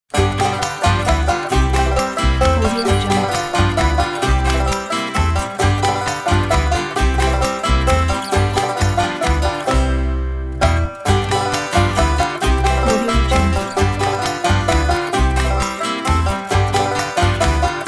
kids quirky folk

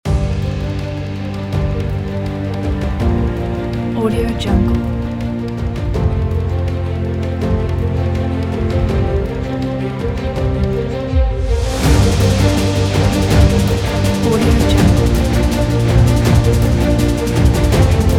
epic

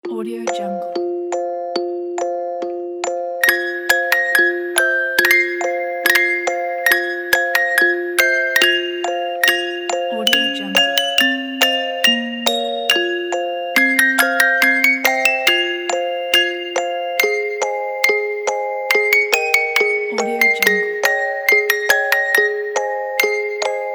happy baby schubert musical moment; kolokol

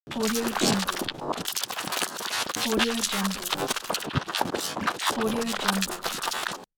alien glitch sfx

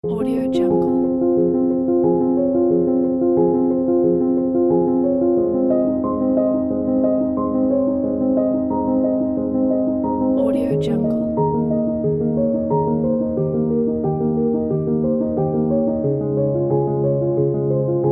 reflective cinematic piano; danaageeva

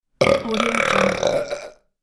burp

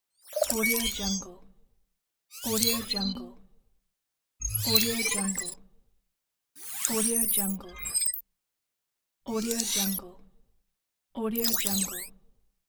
callout

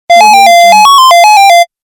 analog calling signal

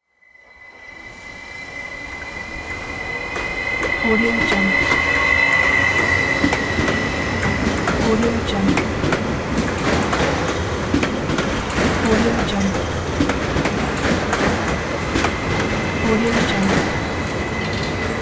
steam train

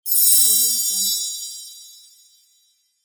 shine

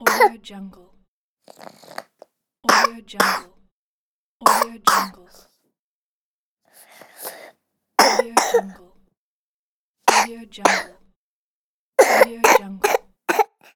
child coughing

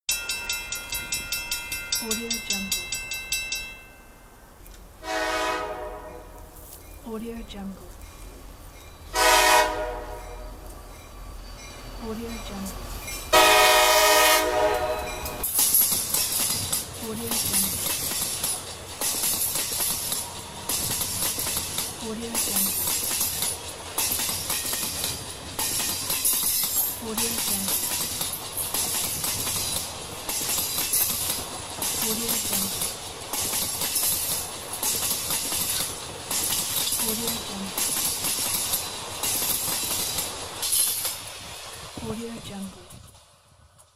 train; erhanbicici

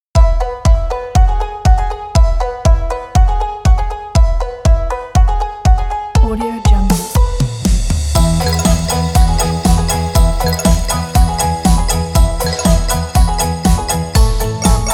chinese new year upbeat

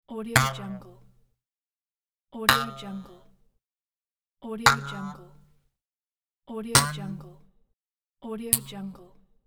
spring metal boing

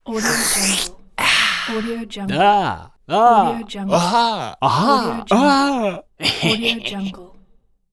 funny guy vocal expressions

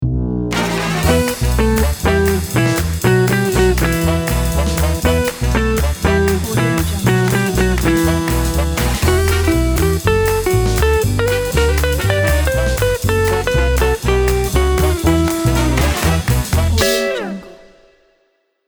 jump and jive christmas logo

